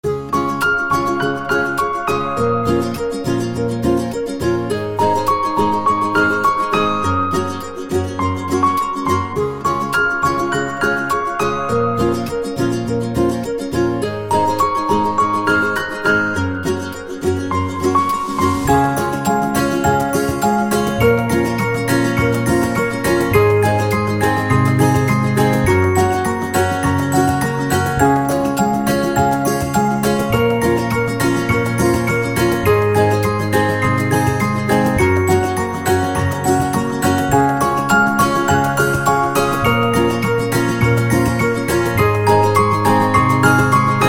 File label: Calm Commercial Folk